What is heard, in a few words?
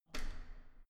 entrance door handle down hard